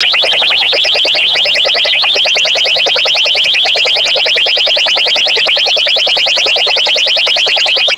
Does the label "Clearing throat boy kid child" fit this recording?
No